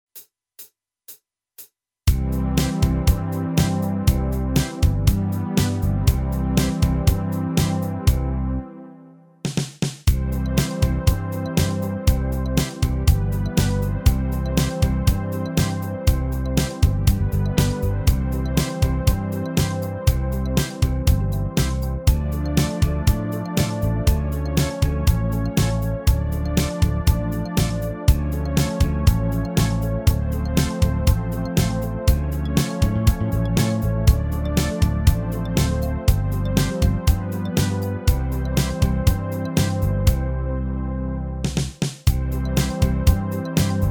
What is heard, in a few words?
brass minus